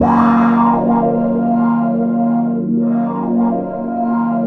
HOUSE MUSIC

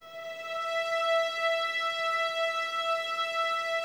VNS E 5, Violins, String Ensembles; Miroslav Vitous

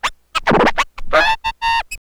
TURNTABLES, Hip Hop

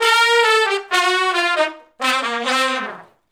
R&B Riff (C) unison, Horncraft; Sections

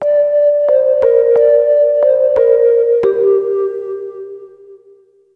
Chime Sequence; Electronic Point Blank

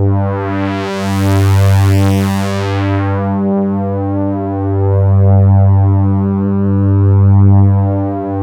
FULL ANALOG; Sound Gallery - Charged

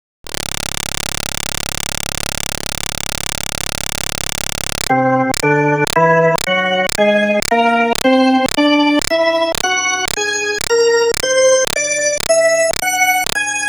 B3 Organ; Masterbits CLIMAX 8

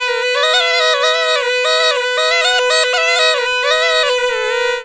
PUNGI

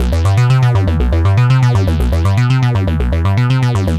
Arpeggio Bass, Prototechno; Sonic Foundry (Sony Creative Software); Basses

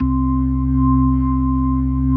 C2S, B3

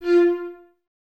STR, String Ensembles, Violas, Detache; Miroslav Vitous

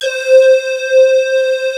CALLIOPE AD, D - 70; Rubber Chicken Software Sample Bank

